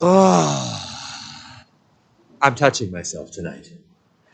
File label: touching loud; deadpool